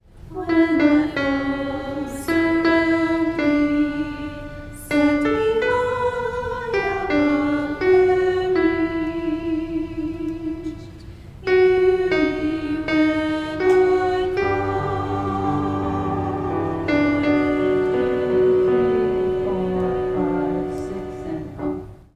alto verse; Gather Hymnal

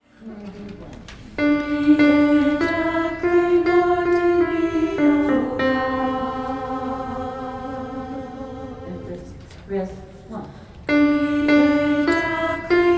alto

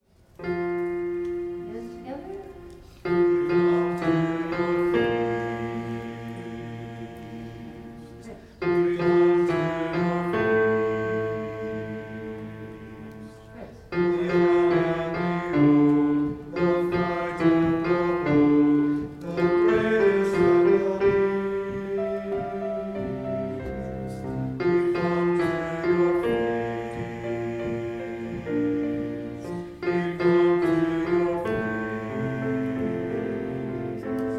We Come to Your Feast melody - men

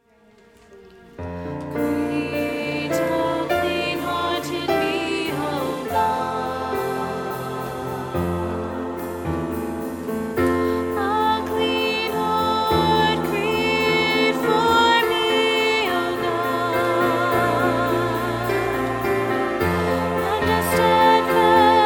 last half refrain into vs 3

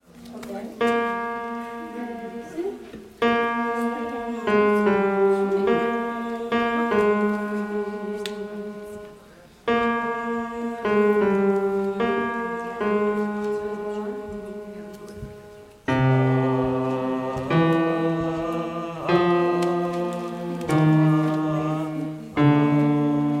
bass verses; Gather Hymnal

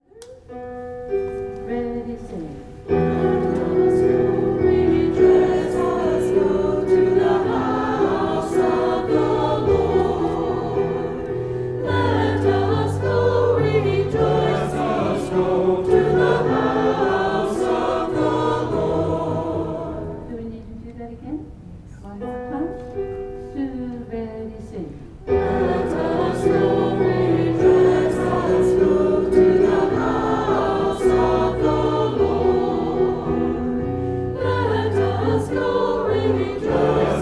refrain all parts; Gather Hymnal

